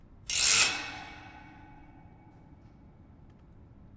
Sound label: Scraping A Grate Surprise